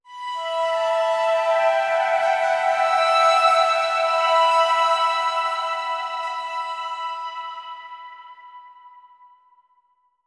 Synth Emin

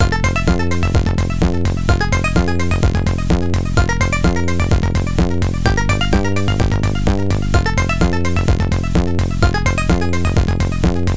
Role Display BGM

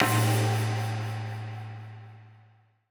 Scoreboard; badland; ios gamerip 2013

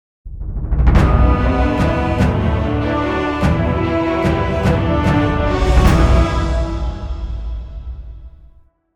Fanfare, Boss

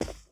Foot carpet; We Happy Few

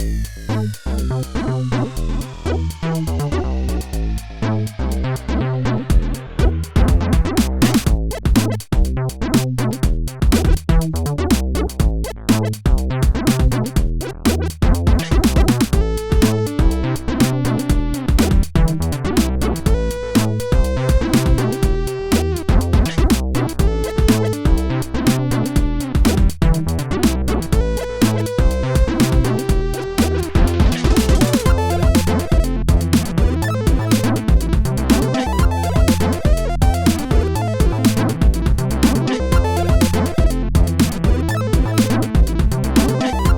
In Game Retro; Sky Force

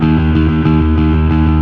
Fuzz D sharp 2; Torchlight III; PS4

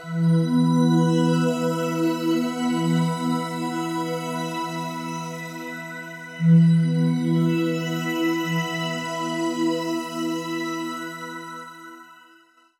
Forest FM Synth; torchlight iii; ps4

